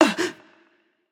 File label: surprised; smite; voicepack; yemoja